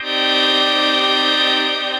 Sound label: Fog, Synth Pad; Neutron; Ableton; Glitch and Wash